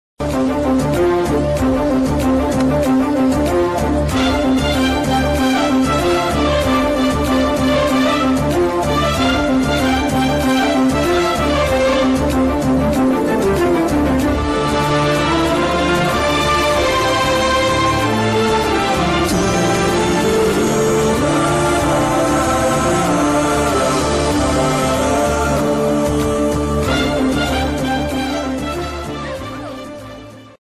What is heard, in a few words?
love at first sight bgm ringtone love ringtone